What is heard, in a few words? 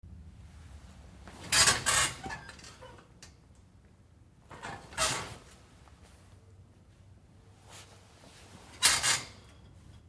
Body Getting Into Sitting Down On Bunk Bed W Spring Squeaks.; bed movement